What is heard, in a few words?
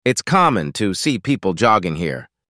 jogging